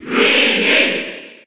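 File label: Cheer Spanish, crowd; Luigi; SSB4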